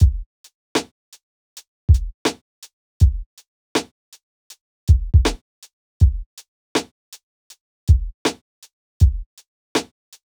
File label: Jazz hop loop 80